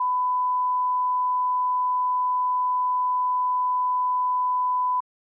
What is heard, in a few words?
Tone Sound Design